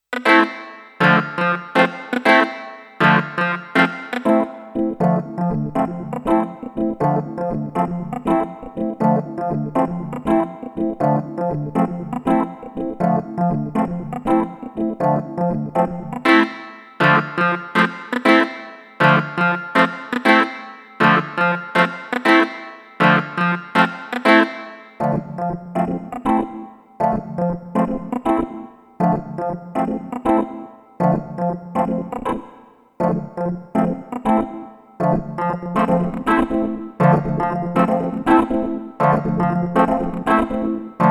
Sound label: Delay Madness